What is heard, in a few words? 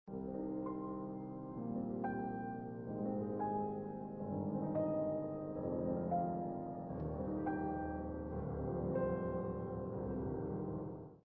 Chorale